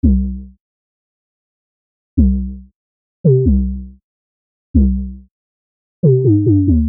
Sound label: Serum 808